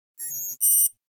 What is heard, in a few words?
Alert Beep Sci-Fi; Hollywood Edge; sound designer's toolkit v2